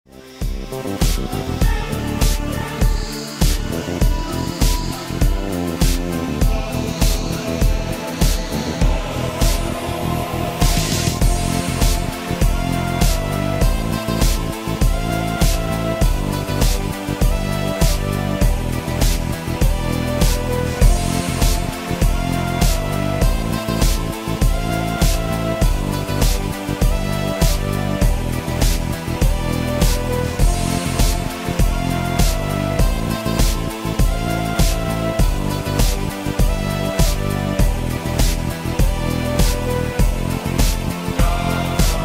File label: instrumental only best part looped